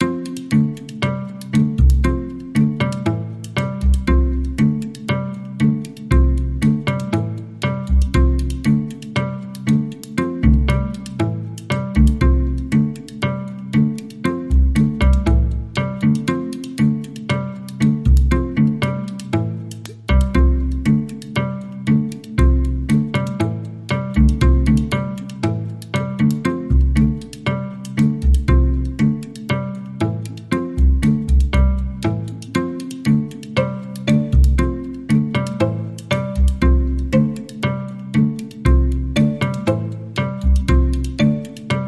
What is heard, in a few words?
Frogs in the Pouring Rain Minimal